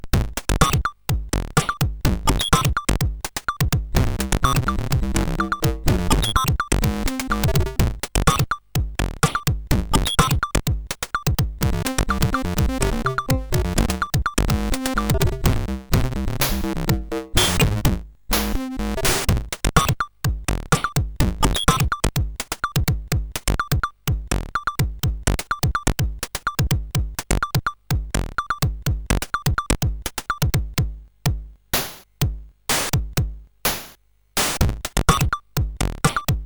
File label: sid CSG8580; CSG8580R5; Mechanique